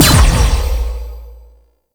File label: Player Weapon Projectile Parry; Warframe; Duviri; Dax